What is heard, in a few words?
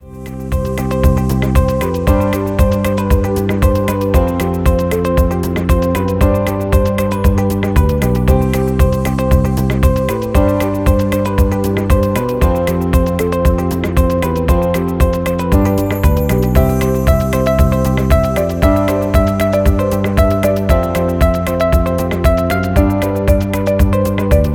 corporate loop fade; latvijas bankas piedavajums